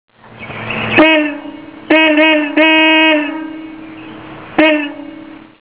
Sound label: vw beep